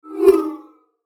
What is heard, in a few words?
Whoosh Short Fast Flange; Blastwave